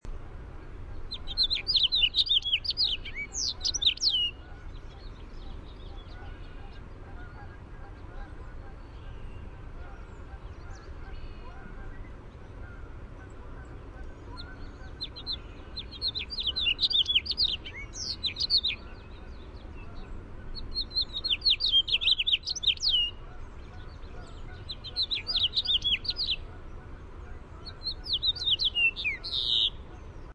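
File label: House Finch song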